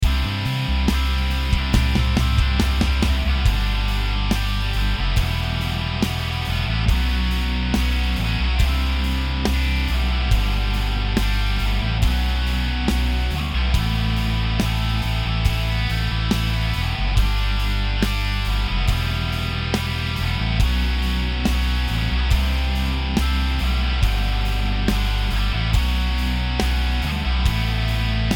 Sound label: backing, Backing Tracks